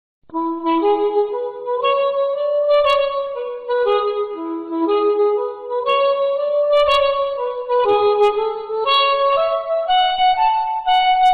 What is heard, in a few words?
Blues Riff boogie woogie